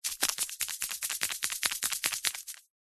foley small plastic sachet of salts or other shake